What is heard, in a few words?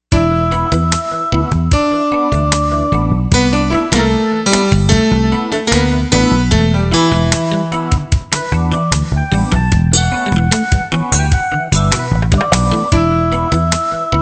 Reggae